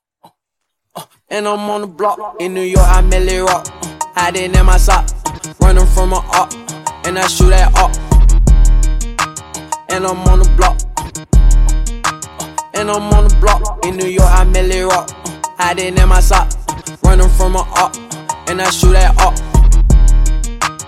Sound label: Acap Dj Intro Outro Dirty 84Bpm